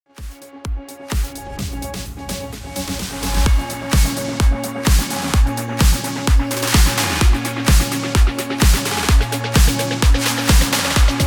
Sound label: PROGRESSIVE; 128 BPM